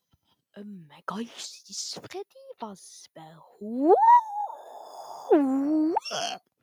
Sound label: drip; fnaf